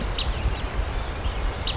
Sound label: waxwing; birds; usgs